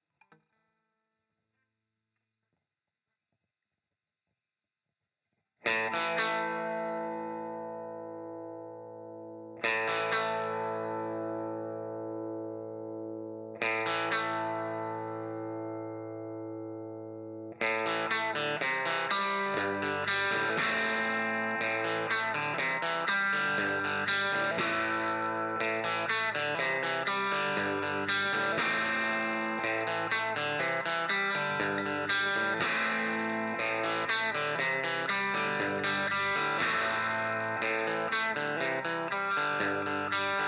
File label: crunch; ik multimedia amplitube 4